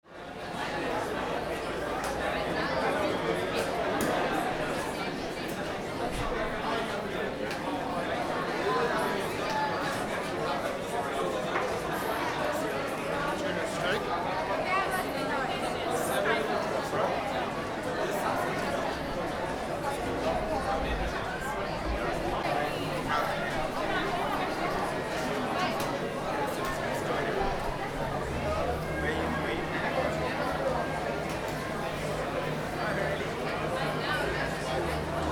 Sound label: Food market ambience people talking movement